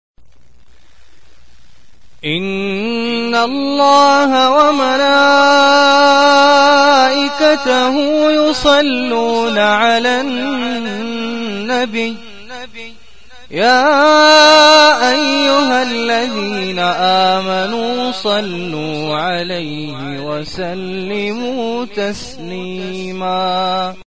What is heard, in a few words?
beautiful islamic ringtone